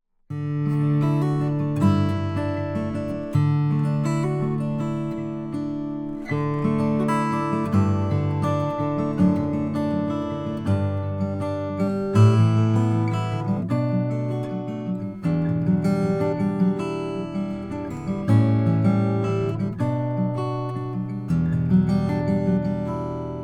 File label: olson sj cutaway brazilian rosewood